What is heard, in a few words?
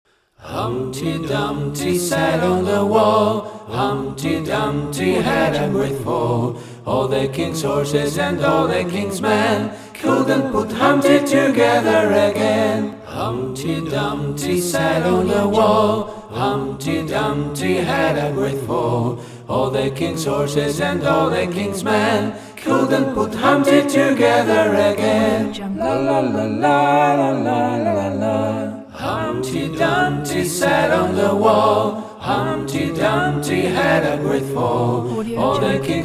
humpty dumpty nursery rhyme vocal arrangement